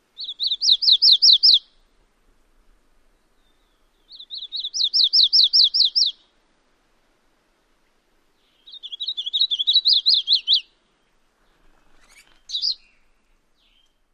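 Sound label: Painted Redstart, songs then call; AZ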